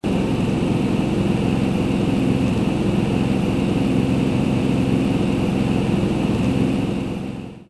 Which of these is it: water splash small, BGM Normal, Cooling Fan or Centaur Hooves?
Cooling Fan